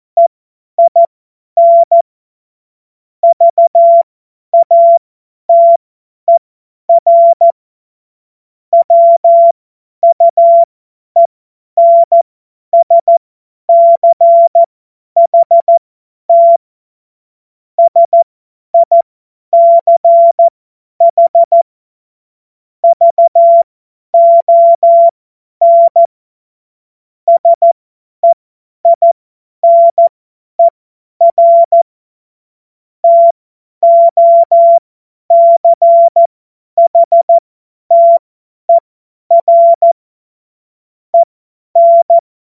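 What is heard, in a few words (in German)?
Witz, Morsekurs, cw morsen; Witze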